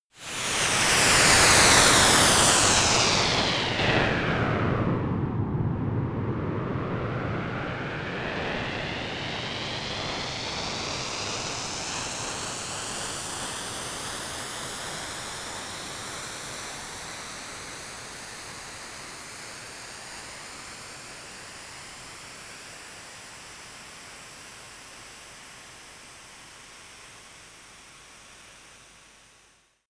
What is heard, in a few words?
fuze rise and drop